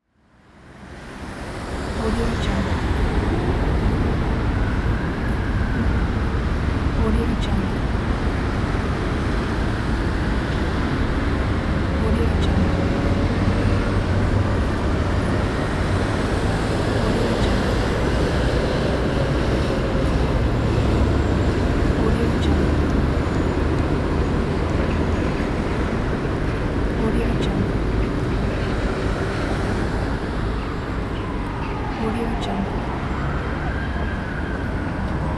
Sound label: city noise